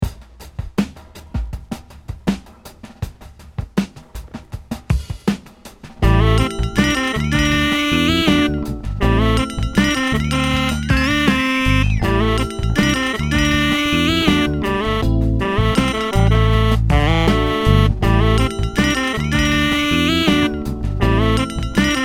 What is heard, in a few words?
Groove in A